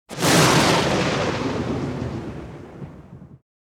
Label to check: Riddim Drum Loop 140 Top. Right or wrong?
Wrong